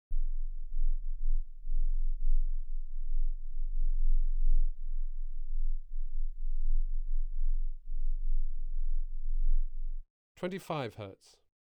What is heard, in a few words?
1,3 octave band, 20 Hz